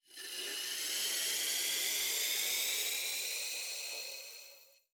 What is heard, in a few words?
rank progress tick up